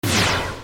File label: shoot